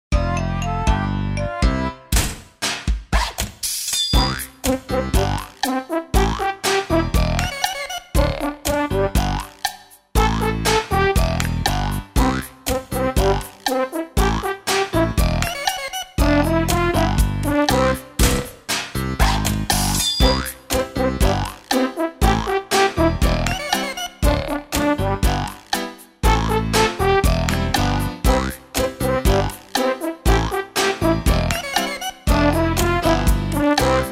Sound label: instrumental; french